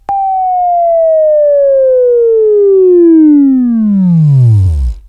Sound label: WAV Right, Sweeping; Sweeping Training program